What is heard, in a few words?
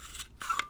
Spring Loaded Plastic; bb - Smol Mechanisms